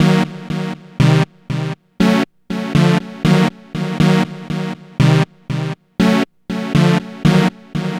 Progressive Synth, Trance

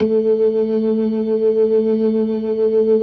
LO MANUAL VIB, Organ

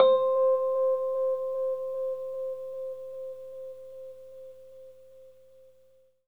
Rhodes, C; 3D Sound; 3D Keys VOL-1

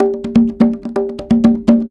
Percussion Loops, Bongo & Conga; Houseworx

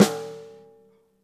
rimshot snares on; JazzFunkKit

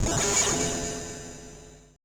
AMBI FX

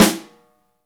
SNR, Snare; Ext.Snare; Club-50 - Foundations

